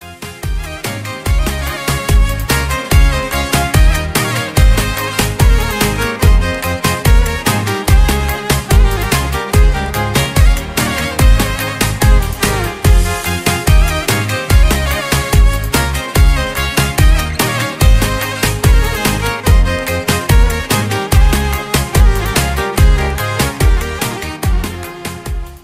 Violino Remix

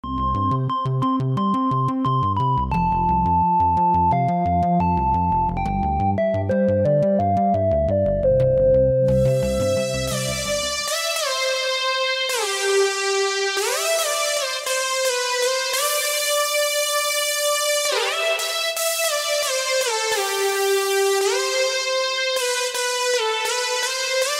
Evangélico

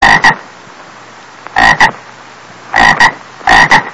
Barulho de Sapo

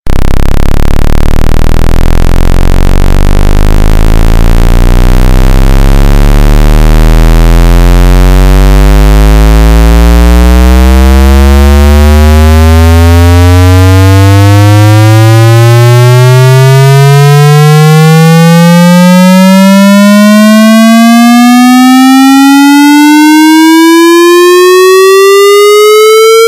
O Som Mais Irritante do Mundo; Toque; telemóvel

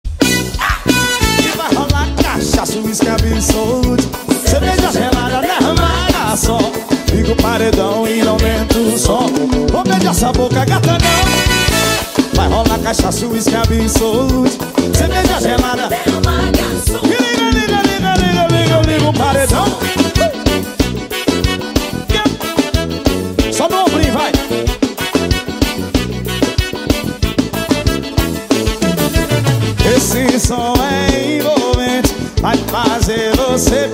Forro

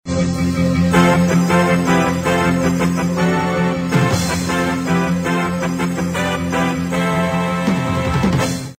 Vinheta; Plantão Globo

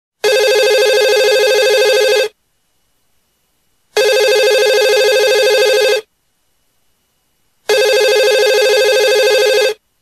Som Telefone Tocando

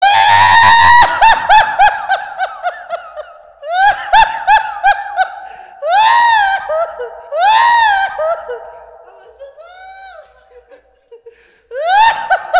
Risada de Louco

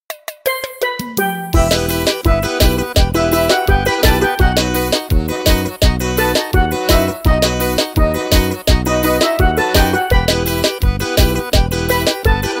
Forró; toque; Hino Corinthians

